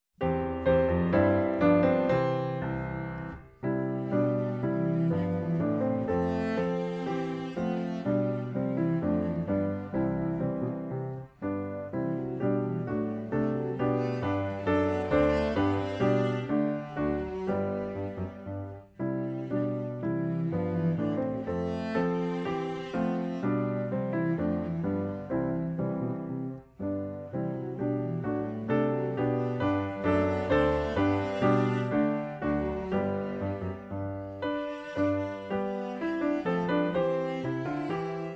Piano, cello; Suzuki Cello Vol 2; Bourree

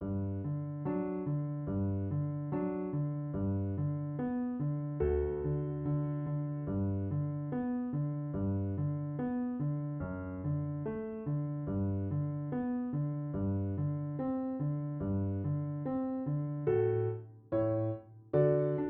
Hunters Chorus, Cello; Suzuki Cello Vol 2

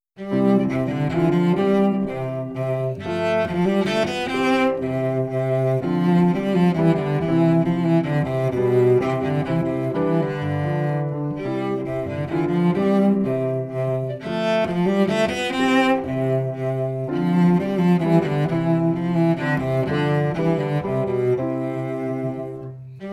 Minuet, Cello; Suzuki Cello Vol 2

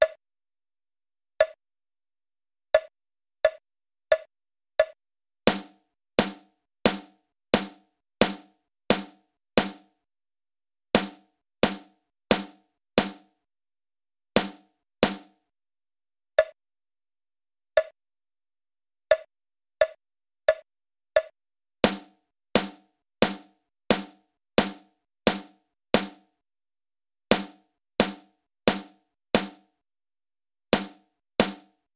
For Snare Drums Only

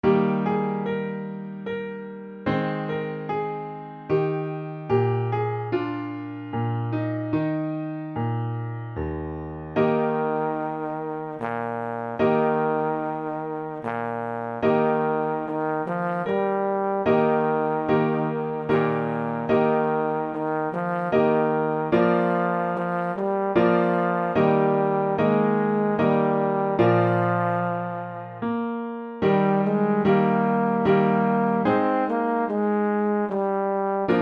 Trom Royal Melody, Trombone/Baritone; Royal Fireworks Trombone/Baritone; Trombone Solo

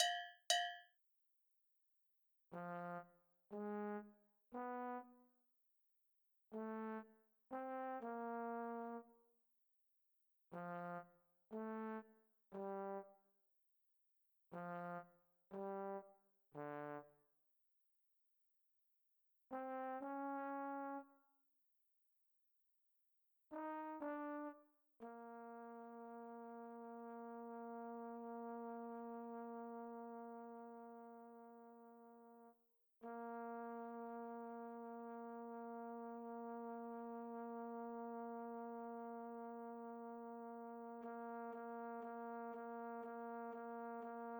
Trombone Classical

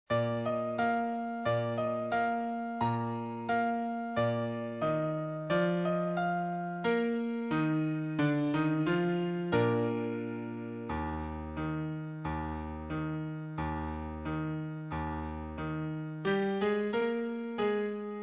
Tenor Musette Acc, Tenor Sax; Mussette Acc.

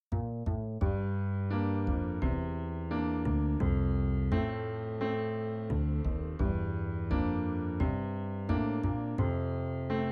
Bass Solo, Bass Guitar Solo

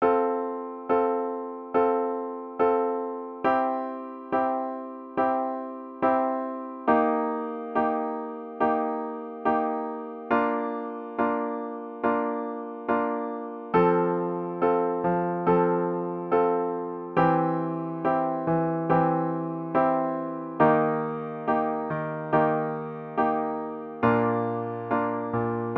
Accompaniment

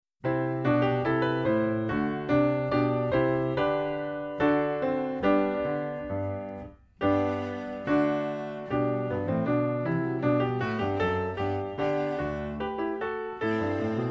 Piano, cello; Suzuki Cello Vol 2; March In G

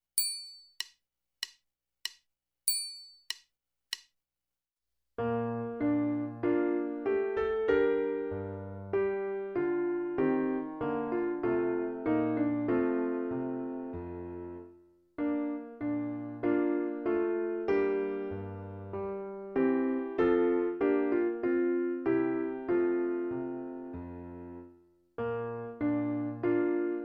Bourrée, Trb, Bar

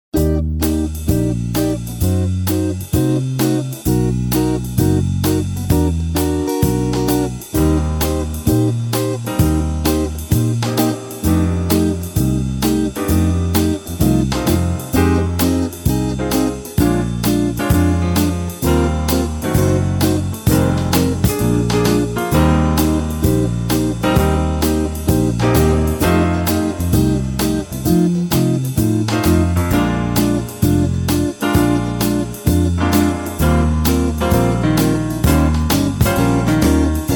clarinet, playback NO guitar solo

